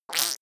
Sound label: fake fart